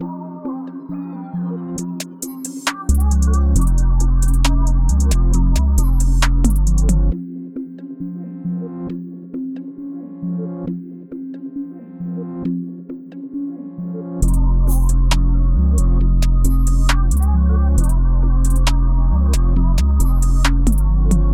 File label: 135bpm Fmin